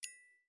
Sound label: notification tone; rock